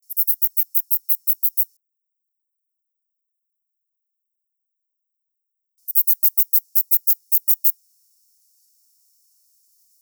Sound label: sphenosternum, wedge-breasted shieldback (Aglaothorax sphenosternum); 10 s of calling song and waveform. Baja California, Mexico; 24.0°C.